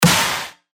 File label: Snare; Gusto; revealed claps snares vol 2